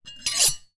knife large blade scrape hard surface or other blade